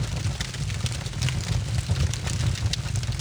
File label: small fire; Soldier Of Fortune II Double Helix; XBOX; ambience